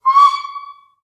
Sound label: Train Whistle; Blastmorph Pinball; xbox series xs